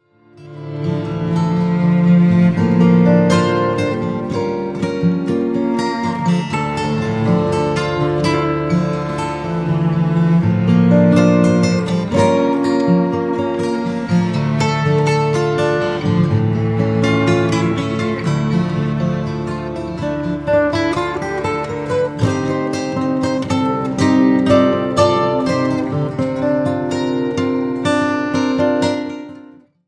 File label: Guitar Lament